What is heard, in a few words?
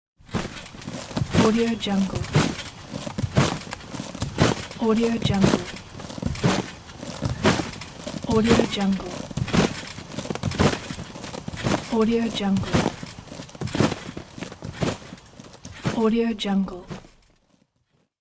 jumping on trampoline